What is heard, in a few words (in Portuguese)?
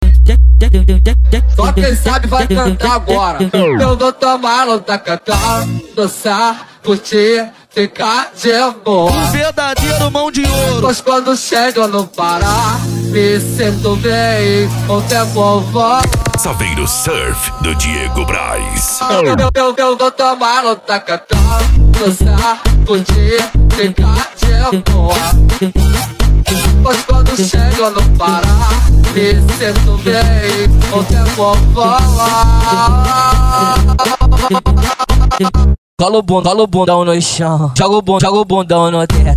funk consciente e funk ritmado